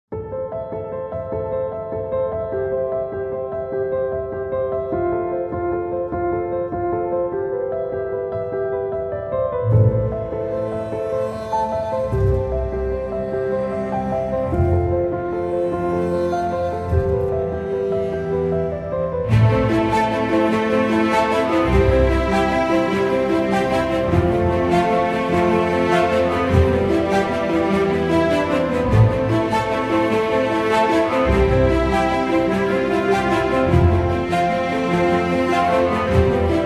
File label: bgm